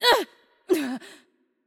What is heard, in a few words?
Hurt Heavy; VO; bloom minigame